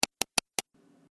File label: Pc Mouse Double Click Release